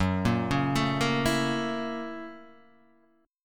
F sharp 7th Sharp 9th F sharp down Guitar Half Step Down